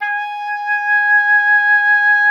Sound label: oboe, G#5; Xsample VOL-6